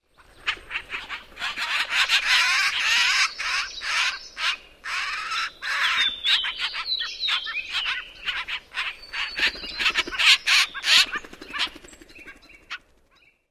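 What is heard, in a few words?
typical harsh calls, Gray Jay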